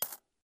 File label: coin; swear-jar